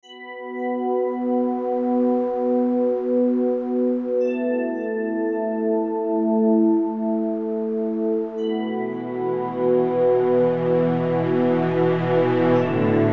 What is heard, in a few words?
Ambient Scene